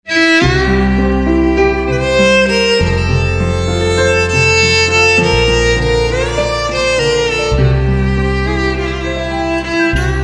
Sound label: Violin